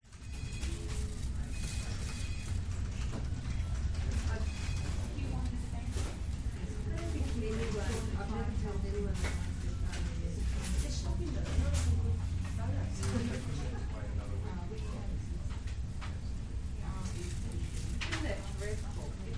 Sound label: Australia Bank; audio hero